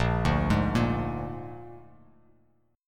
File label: A sharp 7th A sharp, Bass Standard; A#7 arpeggiated; JGuitar